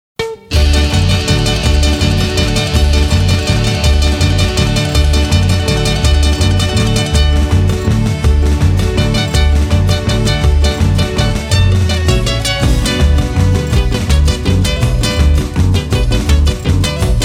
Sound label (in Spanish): pista D